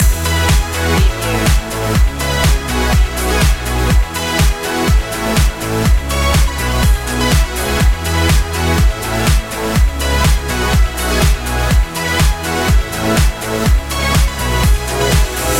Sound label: creamsicle loop